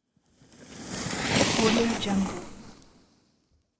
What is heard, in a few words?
cross country ski pass by